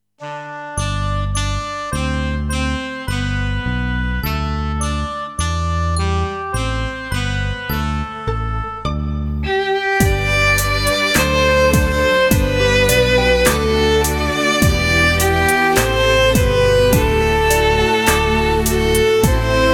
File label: Violin